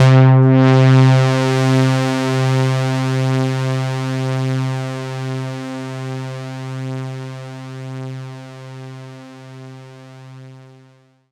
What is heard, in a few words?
C3, Synth Line; 90 s Sample CDs; NiceBeats; SynthLine VOL-31